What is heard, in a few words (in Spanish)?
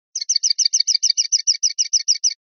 sonaja kios kios; pardillo